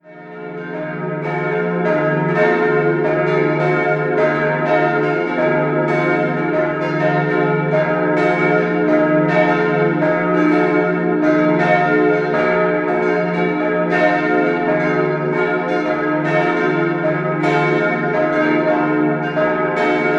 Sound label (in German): Nürnberg Kraftshof, glocken